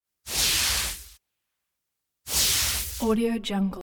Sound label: white light short searing bursts rumble hiss